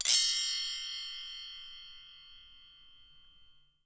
Percussion & Harp; Miroslav Vitous

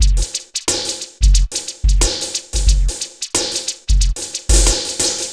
Synth Loop, BPM 90

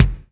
ROOM BD

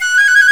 FLT FIFE, British Fife, Fife Trills

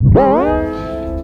tape fx, scratch; Ambient Grooves

